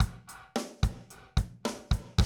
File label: 110, Classic Drum Loops